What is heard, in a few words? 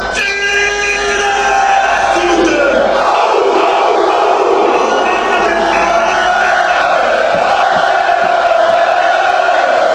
auh auh auh, fanchants